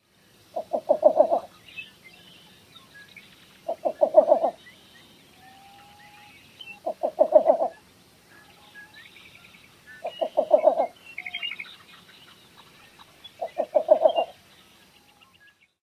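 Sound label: chuckling call, least bittern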